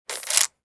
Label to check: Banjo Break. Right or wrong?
Wrong